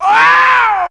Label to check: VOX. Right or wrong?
Right